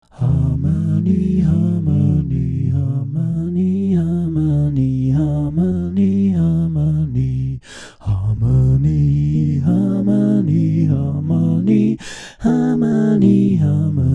Harmony

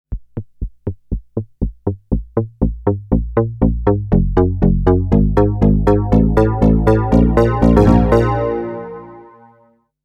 DSS VEL TO FILTER CUTOFF

